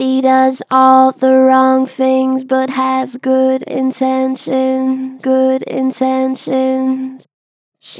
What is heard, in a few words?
Pitch Flat Gentle, Vocals; She does all the wrong things; Harmor Vocals